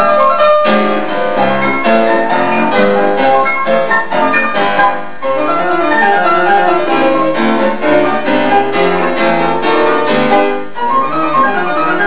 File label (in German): Klavier Duo